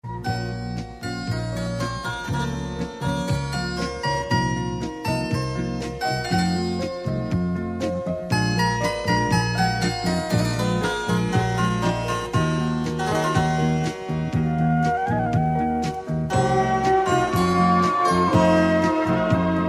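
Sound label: orchestra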